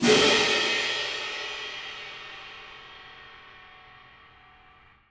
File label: piatti, Percussion; Sonatina Symphonic Orchestra